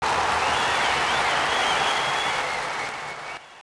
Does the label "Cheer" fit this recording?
Yes